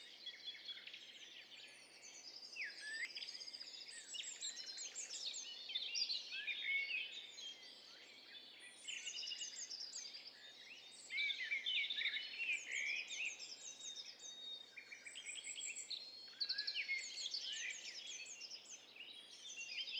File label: STEREO BIRD COCKTAIL PARTY